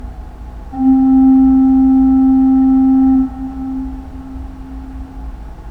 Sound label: bourdon 8